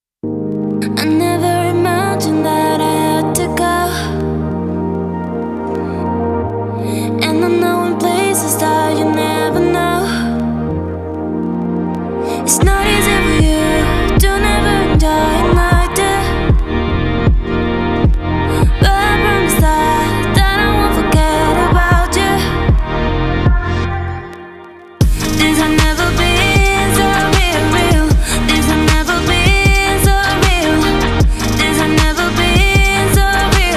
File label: POP FUTURE RNB